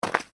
human footstep hard snow ice; Single Footstep On Hard Icy Snow; Footsteps; McKinney Sound

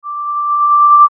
Ascend Feedback Swell; Blastwave

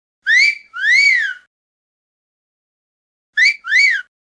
Wolf Whistle, 2 x wolf whistles; Whistling; Voice

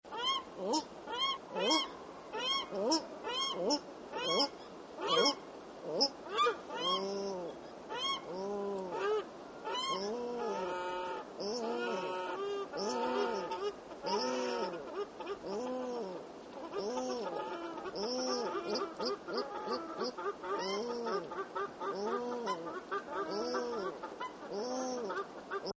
Annoyed Ducks, Birds; Animals

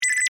Multimedia Beep, Technology, Multimedia, Beep; Beeps

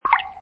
Wet, Button sound; Multimedia

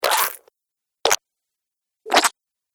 Slime Splats, Cartoon Sound Design Sound Effects; Pack 03

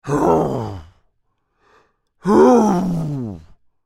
Creature, Human, Moan, Angry x2; Groaning; Big Room Sound

